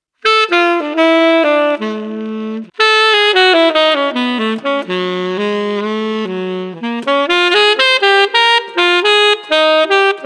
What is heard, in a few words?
Street Sax Hopeful 122